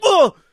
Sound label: shove; video games; source engine; tf